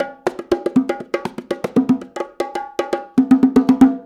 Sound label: Bongos, 70s Analog Funk; Sonic Foundry (Sony Creative Software); What it Is! 70s Analog Funk